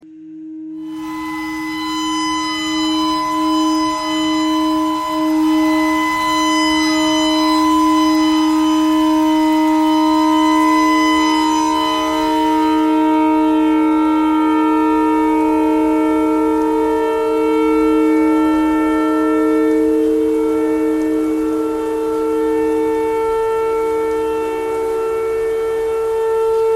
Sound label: tornado siren clarinet notes e noise